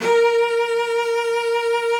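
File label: cello vibrato, A#4; Xsample VOL-7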